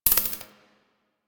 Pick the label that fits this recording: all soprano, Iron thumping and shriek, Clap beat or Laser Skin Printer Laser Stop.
Laser Skin Printer Laser Stop